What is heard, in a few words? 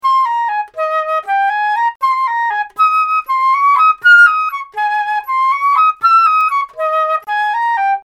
Flute Solo; Film